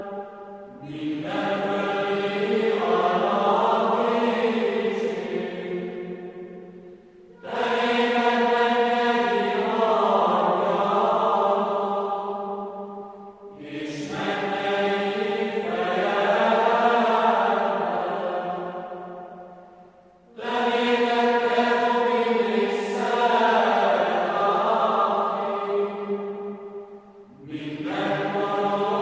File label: chants